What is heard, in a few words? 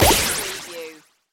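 Production Element Hit Bubble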